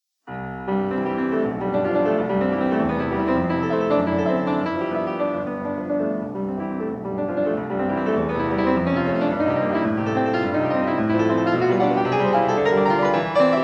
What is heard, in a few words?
Prelude No. 1 in C major; F. Chopin; 24 Preludes, Op 28